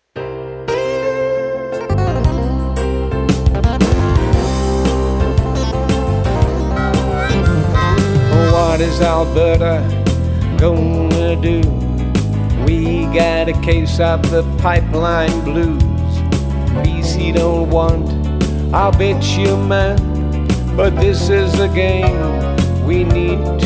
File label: Blues